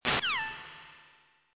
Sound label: codec close